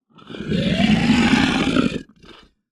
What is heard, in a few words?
lion scream